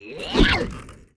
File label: war attack; Mob